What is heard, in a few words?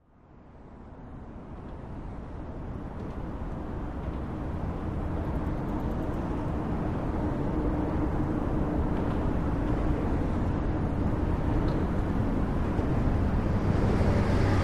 Freeway Overpass Tir, highway